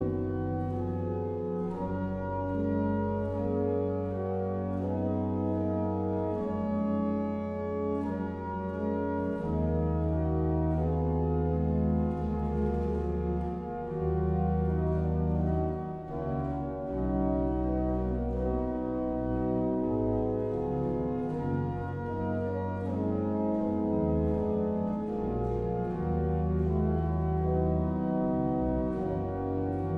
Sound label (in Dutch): orgel